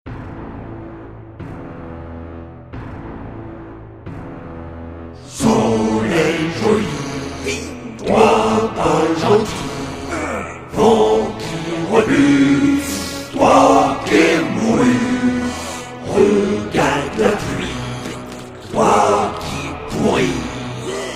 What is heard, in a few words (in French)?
hymne barbare; reflets d acide